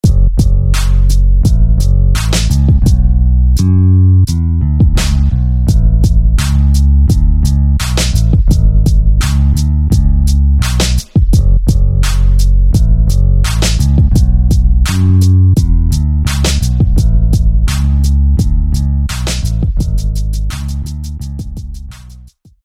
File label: Bass; Stoned; SFB FX